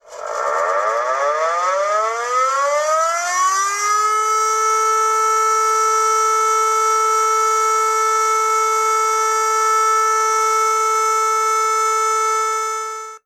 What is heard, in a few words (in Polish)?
sygnal ciagly